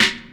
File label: SD, Dance Mega Drums; Best Service